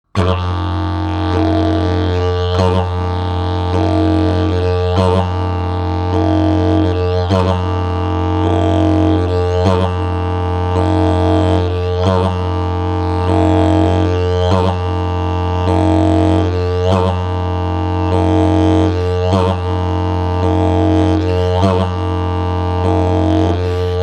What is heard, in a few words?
Dhirrl Lo Slow